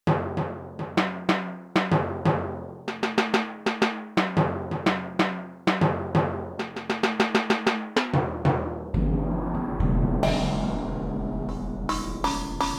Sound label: High Tune, Timpani & Gong, Yamaha TX16W